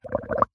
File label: nature water bubbles bubbling in foamy soapy water short burst